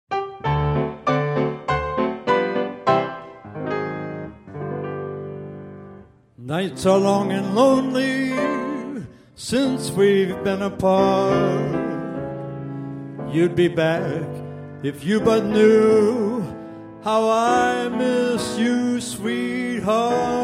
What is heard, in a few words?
Saxophone Sample